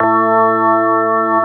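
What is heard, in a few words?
CX3 organ, Keyboards Old School; USB Soundscan vol.09; AKAI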